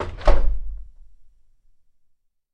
Apartment Door Clos

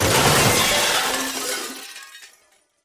Metal Glass, mixed; Metal and Glass

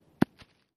Golf Ball Dropping

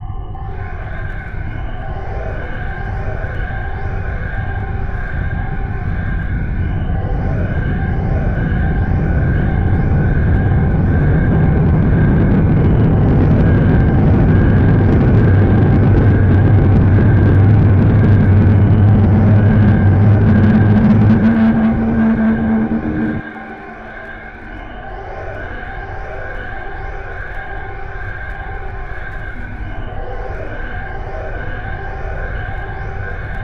Ignition Gyroscopic, deep

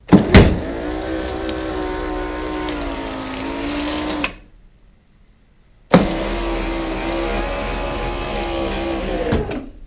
Sunroof Elect Open Cl

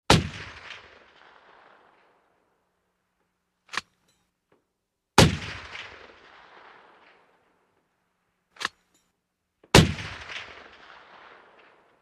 Mach Gun 50 Cal Shot, 50 caliber exterior; WEAPONS - MACHINE GUNS HEAVY 50 CALIBER: EXT: Single shots with cocking, 50 cal

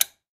Lamp Switch Flip On

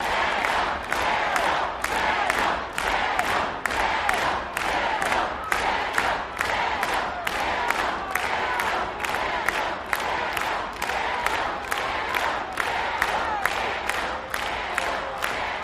Crowd Chants "Daniel"

